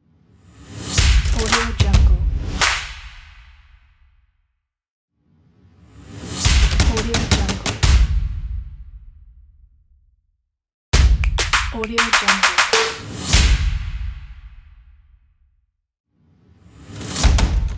drums action the end pack

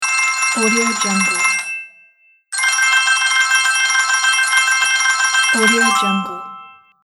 coin win

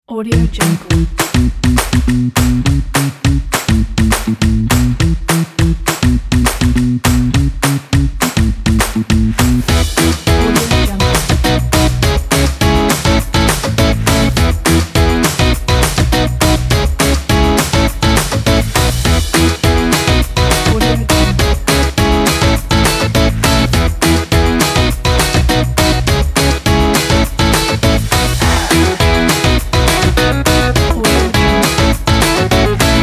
happy energetic rock

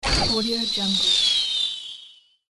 firework archer

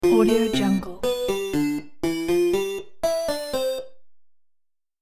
game tune sound

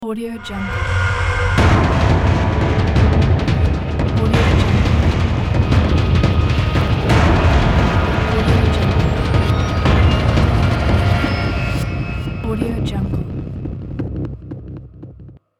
short dark trailer ident

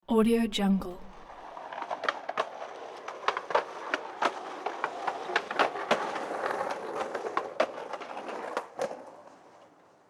skateboard pass with maneuvers

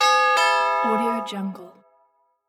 classic doorbell ring

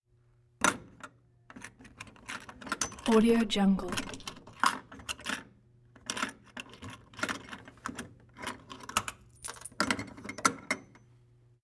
vending machine change receptacle opening

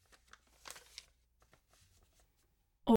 paper handling

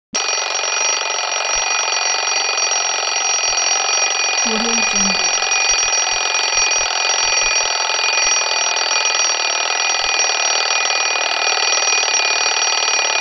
alarm clock rings loudly; hollywoodedge

